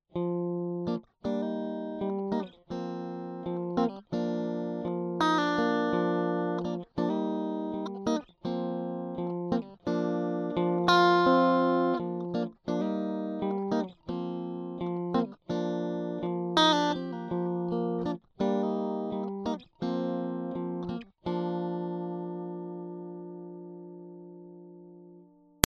4x10 ambient

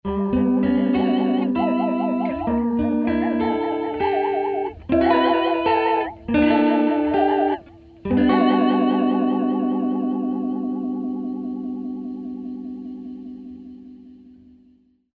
Resonance

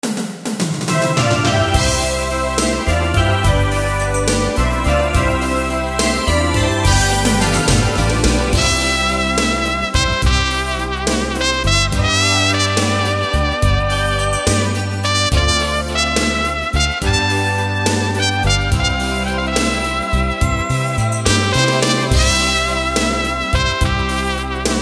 dancing

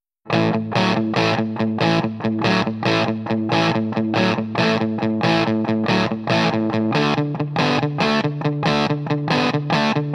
Classic Rocker

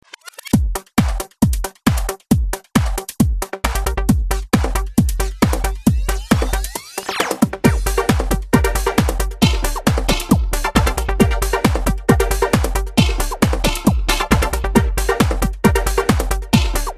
fantom xa

